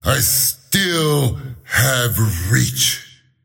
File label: attack pain; slyrak; dota